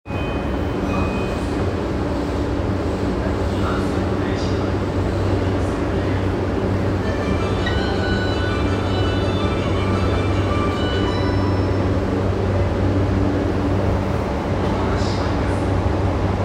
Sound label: train sounds; 南北線駒込南